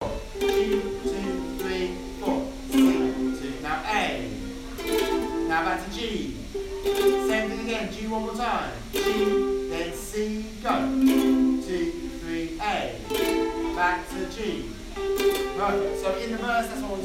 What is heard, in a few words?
ukuleles; year 4